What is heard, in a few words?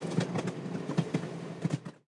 Train Passing Idle; subway surfers city sound effects; Android, iOS